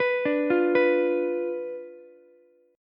B Diminished B down Ukulele; Bdim arpeggiated

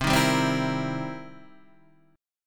C Minor 7th Flat 11th, chord; Cm7b11 Chord {8 6 5 5 5 6} strummed

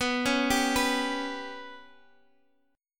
B Suspended 2nd Sharp 5th B, chord; arpeggiated; {X X 9 6 8 7}

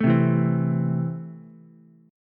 E Suspended 4th Sharp 5th E down Ukulele; Esus4#5 strummed

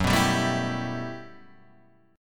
G Major 13th

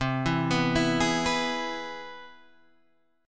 C Augmented Major 7th, Guitar, Open G

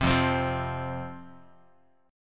F 5th F Guitar; strummed; chord; 3,1,3,3,x,x; JGuitar

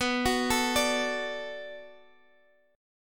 D 6th B, chord

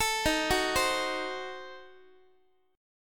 D sharp Diminished 7th D sharp Guitar; arpeggiated; D#dim7; x,x,12,13,10,11; JGuitar

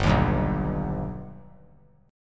G Augmented G, chord; G+ strummed; 7,10,10,9; JGuitar

